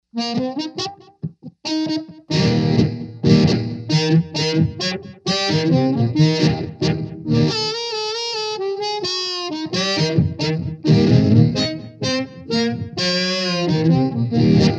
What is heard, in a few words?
Low Octave, RP200; DigitechRP; Kong